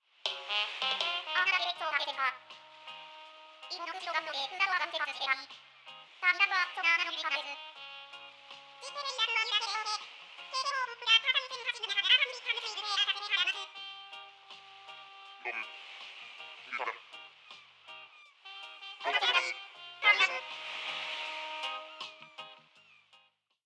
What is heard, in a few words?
Radio Jingle; Paradise Planning